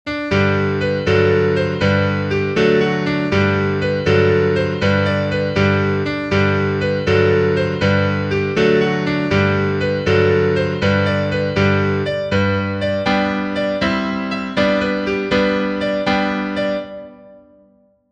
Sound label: piano